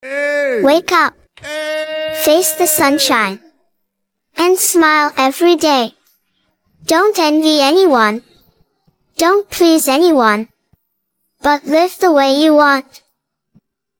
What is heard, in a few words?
vocals